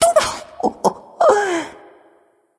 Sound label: hehheh; smite; voicepack; sylvanus